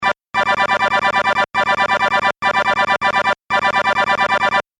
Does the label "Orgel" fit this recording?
No